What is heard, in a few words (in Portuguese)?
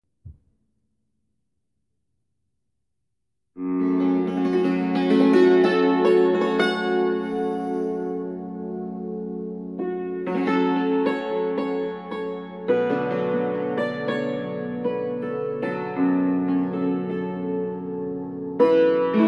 Saltério